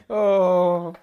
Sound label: ohhhhh